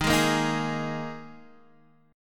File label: Eb Suspended 4th Eb Guitar Half Step Down; Ebsus4